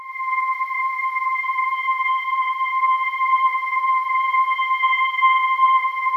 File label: JV dark vox, JV-1080; Syntec; Wall of Sounds